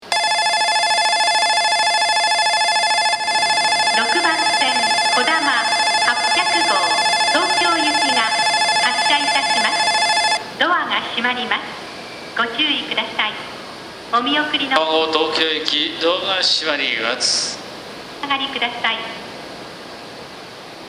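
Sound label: Mishima departure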